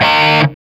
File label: E 4, Soldano rock guitar; Spectrasonics; Hans Zimmer Guitars VOL-1